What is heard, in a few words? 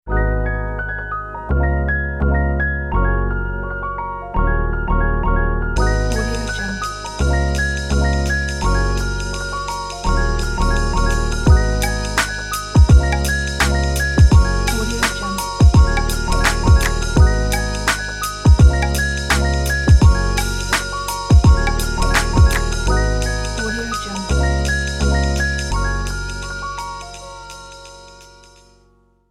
vintage piano logo